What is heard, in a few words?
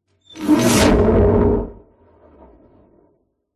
Cinematic Metal Whoosh